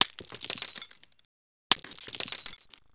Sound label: Glass Break